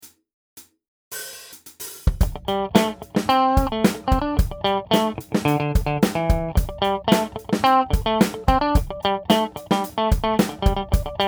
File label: Funk Rhythm Guitar Pop Funk